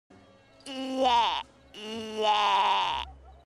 dying giraffe

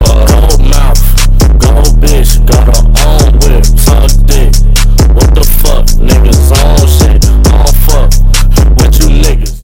hahahaha

